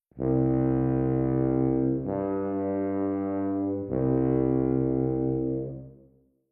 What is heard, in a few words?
long